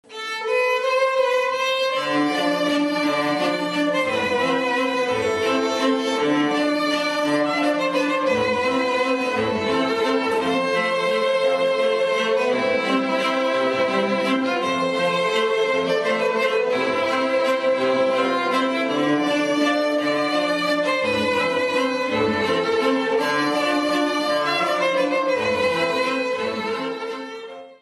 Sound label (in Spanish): Vals